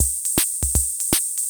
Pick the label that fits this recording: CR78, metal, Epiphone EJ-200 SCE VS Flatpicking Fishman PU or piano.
CR78